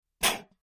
Air Mortar Blast From Close Point of View, At 30 Pounds Of Pressure, X3